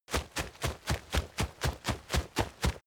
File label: step leaves wet sprint